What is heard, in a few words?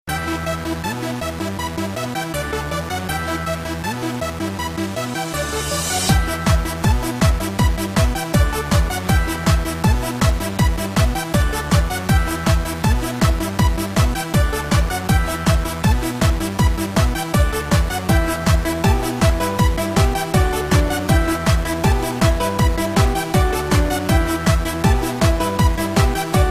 Jump Style Tone